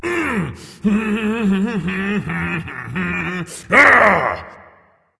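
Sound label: non verbal yay, voice; cernunnos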